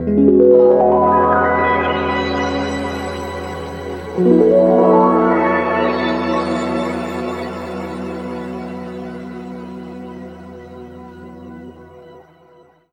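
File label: E+D pitch shift wah, Guitar Samples; East West; Steve Stevens Guitar Samples Collection; PTCH-SHFT+WA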